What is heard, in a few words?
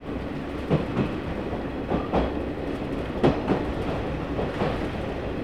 TRAIN; AKAI S-Series CD-ROM Sound Library VOL-6